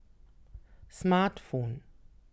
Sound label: Smartphone